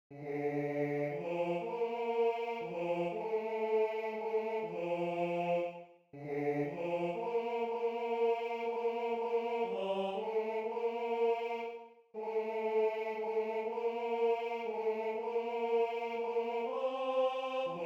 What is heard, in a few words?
Tenor II; Apparuit